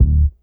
IN HOUSE BASS; Sampleheads; Ultra Freakin' Bass

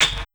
rock FX; ROCK 132; Big Beat Drumloops

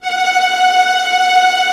Viola Trm F#4; Digital Sound Factory Virtuoso

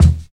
KICK; East West; Phat & Phunky

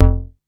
BASS SEQ F2; SEQ AND PERC; Music Store - Claudius Diemeter Schwerlos

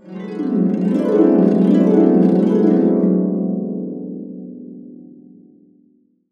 DB7 CA UP DN, Harps

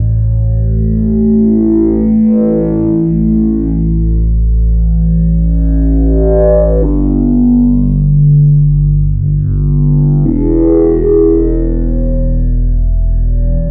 Synth Bass

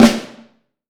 Snare Drum

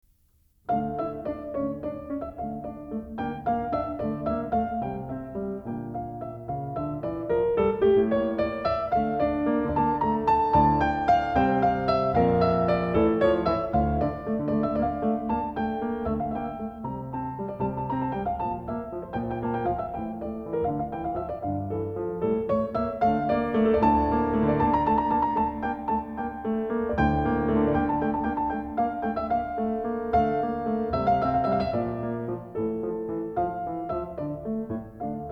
Hindu Melody; Fourth Way; Song and Rhythms from Asia

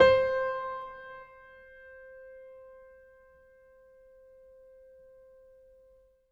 MIX L, Acoustic Piano, PIANO STEREO; InVision Interactive; Miroslav Vitous

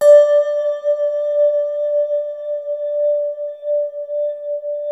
GLAS D3, ATMOSPHERICS; Music Store - Claudius Diemeter Schwerlos

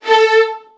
VN 16TH, Strings

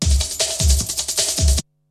Beats, Breakbeats, Techno; Syntec; Wall of Sounds VOL-2; TECHNO-BOX; 90s sample CD

